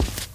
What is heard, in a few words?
walk tile, footsteps